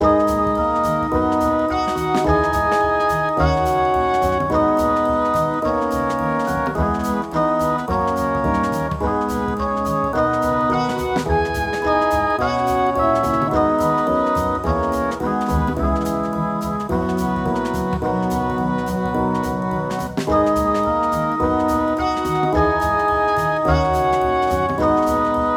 instrumental music ensemble; enhance brain memory

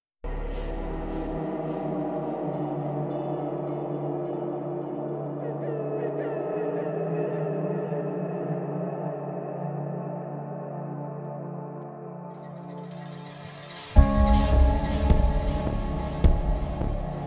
ambience; wurmwater

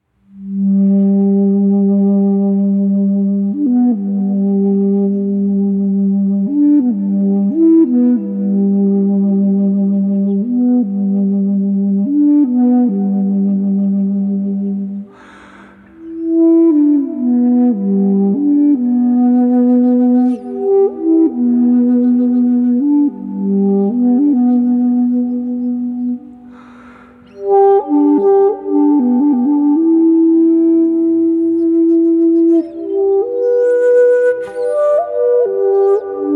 rain anasazi flute keyboard

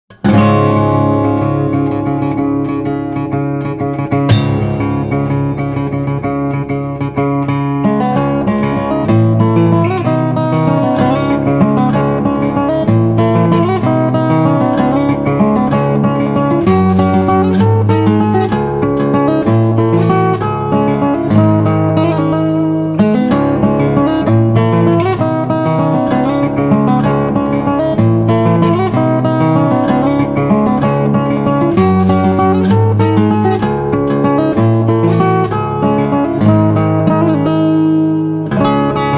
fingerstyle guitar

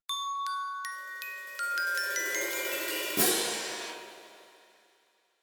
perc free spirited

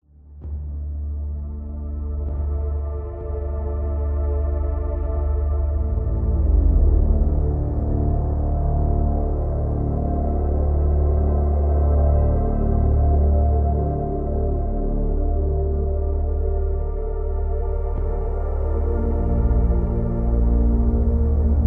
ambient

